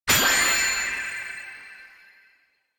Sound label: elec bachicoon; anemoi